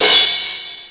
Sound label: cello